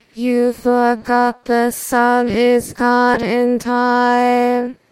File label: singing